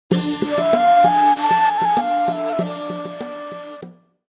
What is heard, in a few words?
Egyptian Flute Melodies; CowaBoom; Android, iOS, macOS, mobile, online